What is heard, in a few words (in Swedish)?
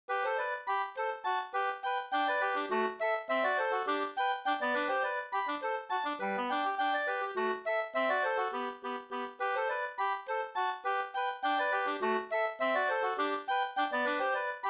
polkett G dur; e Spelare-Johan Ol 2st